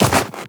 Run Snow, Footsteps; Warframe; Tenno

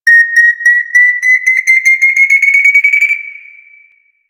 Grenade Warning; Lasrian Goonadier Grenade; Lasrian Soldiers; Weapons

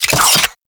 Rolling Drone Turret Levitate Start; Warframe; Grineer

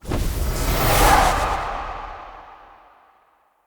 Rage Cast; Fey; Founders Champion

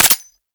Scaldra Pistol Mech React

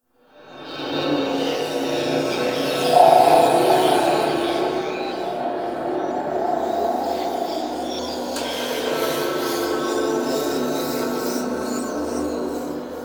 Exterior Dark Ghost Swirl, Ambience; Warframe; Railjack

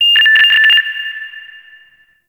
Interior Orbital Station Telemetry High; Warframe; Tau

